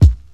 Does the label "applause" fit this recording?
No